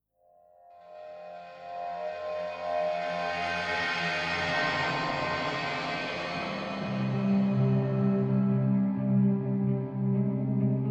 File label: Suspense